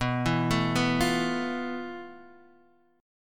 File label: F Major 7th Flat 5th B, guitar chord